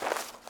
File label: pine step; Golden Crayon Sounds